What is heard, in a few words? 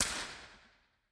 Scala Surround Right L; IR